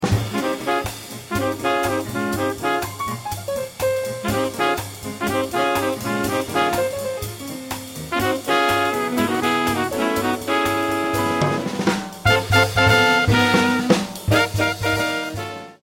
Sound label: minus bass